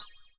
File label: CYM, Ethno Techno; ILIO - Ethno Techno